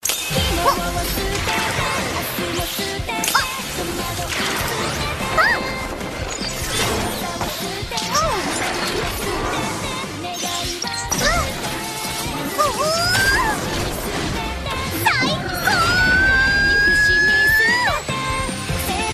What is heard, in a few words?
stinger